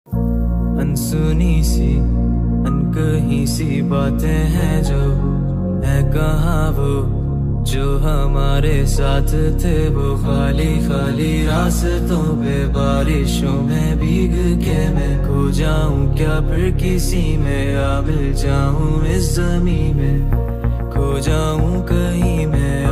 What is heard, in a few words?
hindi rap ringtone